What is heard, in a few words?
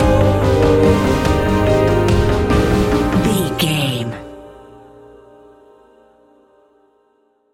stinger; Terror In The Woods; Video Game